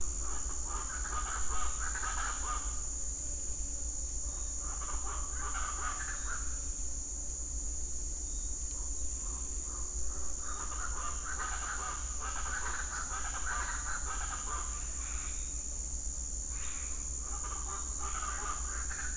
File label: Speckled chachalaca; پرنده گوش خراش خالدار